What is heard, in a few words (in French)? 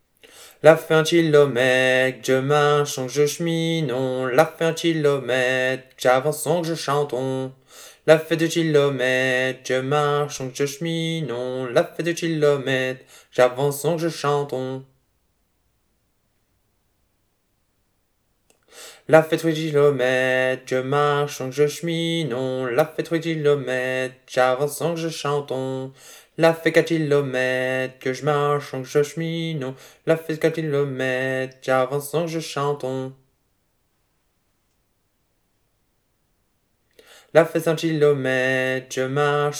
Chant